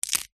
horror toe or finger break; zapsplat

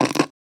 Cable Crackle

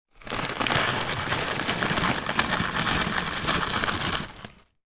food bombay mix pour from pack into bowl; sound effects one

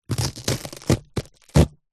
food cabbage cut slice knife

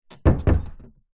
household door close internal wood modern; zapsplat

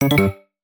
app alert tone remove delete

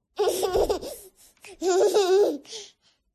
12month baby SMOOCH laugh giggle

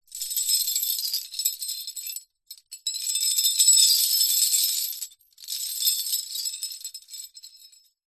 industrial chain small jangle

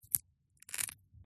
Foley Crack Wood Toothpick Snap; PMSFX

